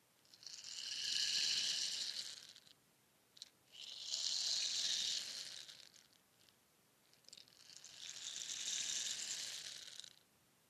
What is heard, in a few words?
kamisin rain stick